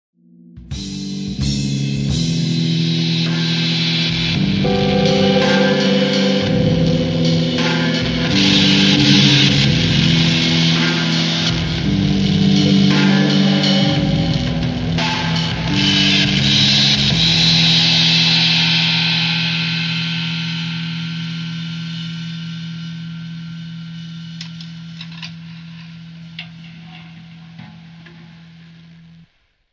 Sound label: salt loop